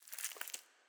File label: weeds, alien